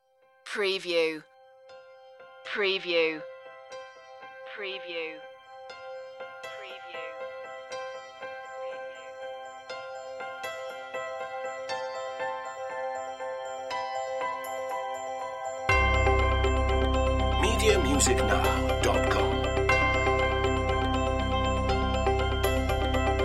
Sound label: seize the day underscore